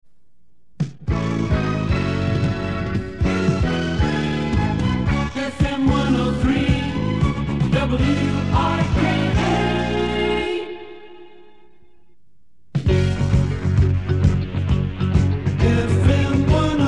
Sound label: Jingles; WRKA Mid-80s Jingles; We're guessing these WRKA jingles came from TM productions.